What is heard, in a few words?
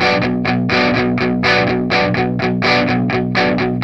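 125, Vintage Blues Guitar rhythm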